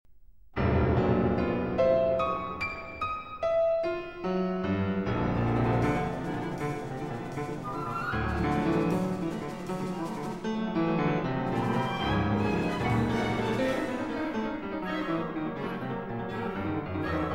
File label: Piano Concerto, Molto Vivace; Piano Concerto No. 1, Op. 45